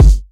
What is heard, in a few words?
subby kick one shot G key